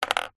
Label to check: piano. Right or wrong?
Wrong